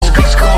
shk shk

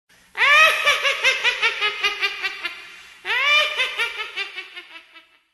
witch laugh; lol

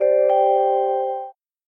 Android 4.4 Deneb notification

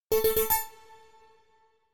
quick zap

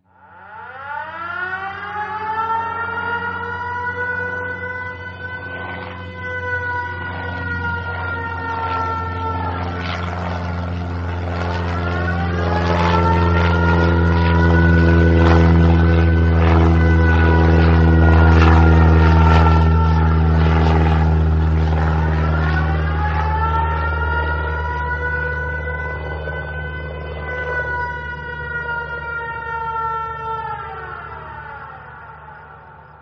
air raid flyover

